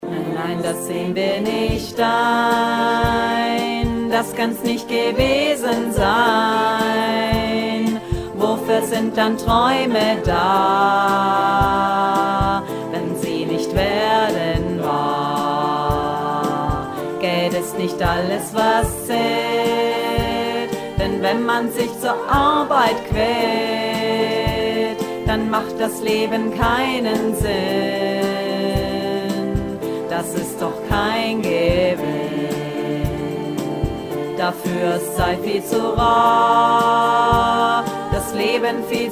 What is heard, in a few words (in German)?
tiefe Hauptstimme